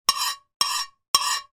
household knife or other cutlery scrape on china plate 3 times